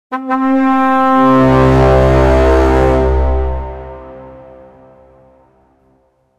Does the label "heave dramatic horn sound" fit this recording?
Yes